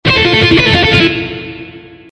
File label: guitar